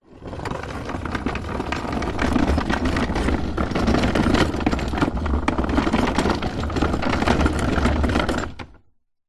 industrial wooden cart small roll concrete

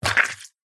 cartoon squelch wet drop; zapsplat

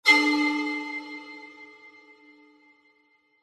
hit violin stab reverb shock jumpscare

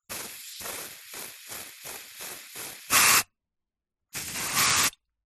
household deodorant aerosol spray various

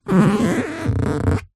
foley balloon inflated squeak rub; zapsplat

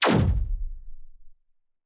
science fiction laser hit thud zap delay; zapsplat

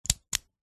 plastic clip mechanism click; foley; zapsplat

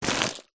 present gift wrapped pick up grab; foley; zapsplat

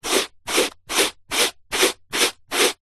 foley cardboard small pieces scrape together; zapsplat

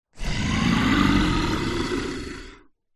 horror monster growl wide stereo; zapsplat

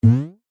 cartoon twang boing spring jump plucked; zapsplat

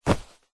foley clothing shorts thick cotton grab

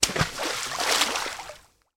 water splash slosh

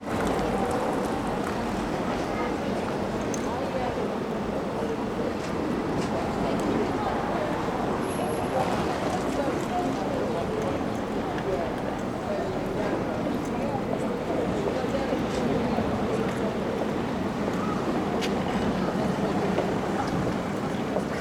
ambience public place town centre pedestrians uk

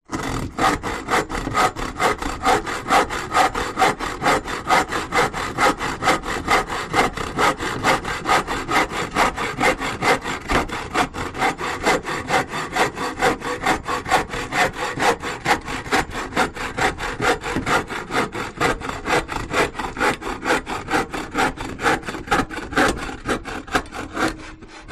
Handsaw

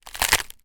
food potato chip crisp crush; zapsplat

